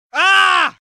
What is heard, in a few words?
Man Shouting